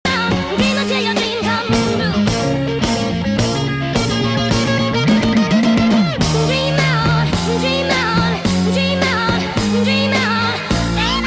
sped up

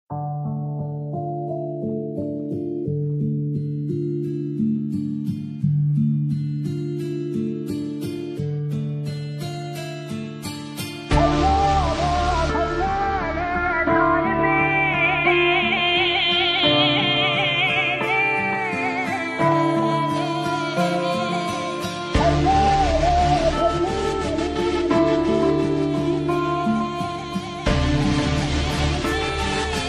trap kurdish new music

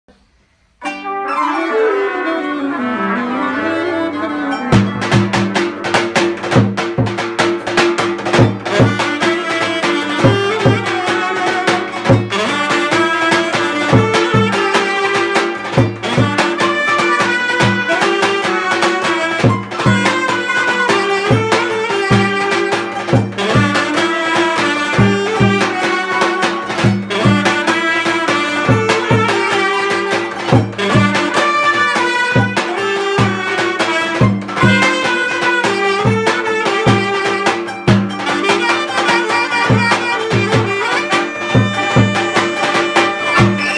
happy birthday roman versiyon

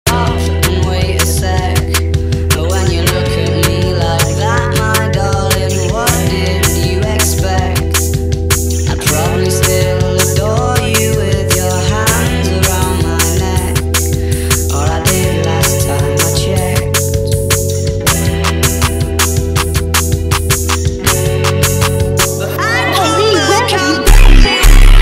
hood trap remix

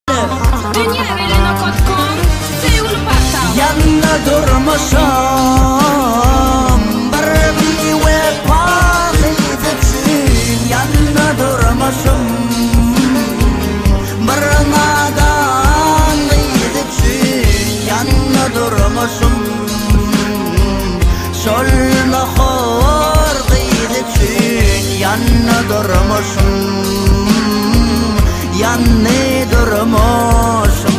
karaoke

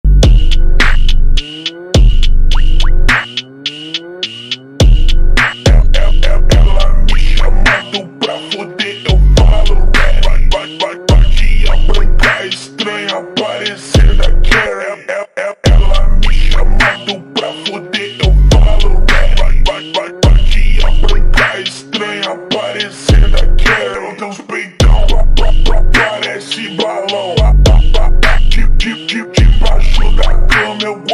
phonk; Phonk Edit

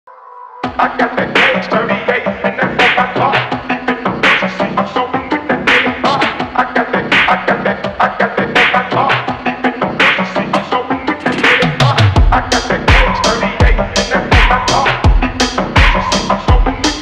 slowed reverb

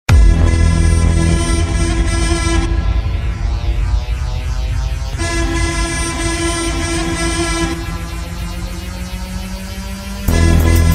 mafia zurna trap; asiret muzigi

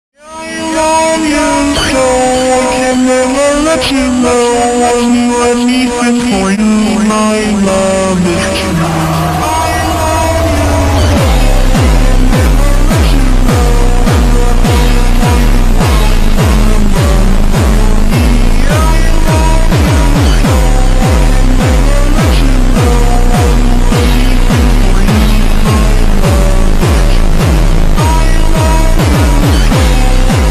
jumpstyle ultra slowed

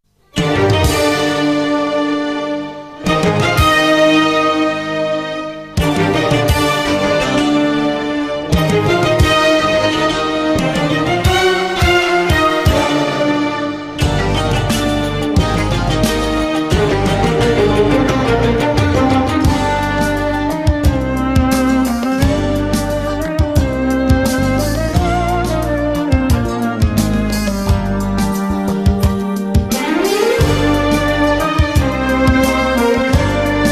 karaoke

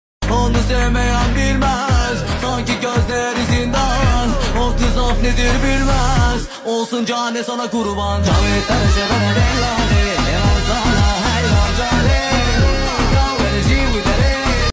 karaoke

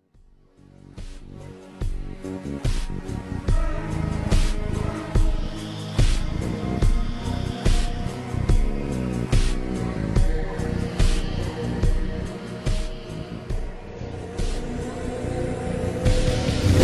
instrumental

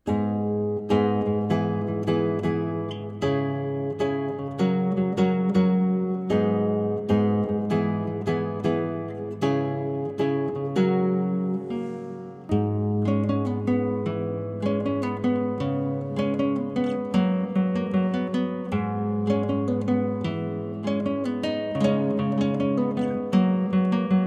fingerstyle classical guitar cover; Miraculous Ladybug

